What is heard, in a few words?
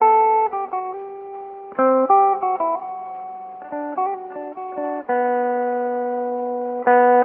guitar, Loops, Phonky